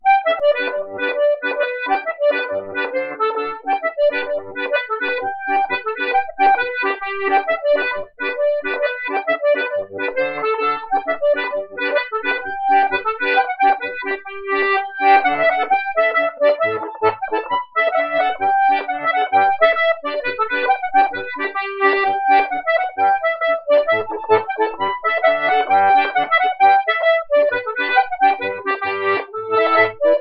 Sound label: Two slides; rencontres-2013